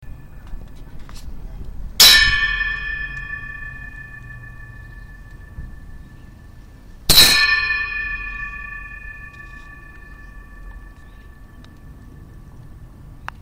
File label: Bell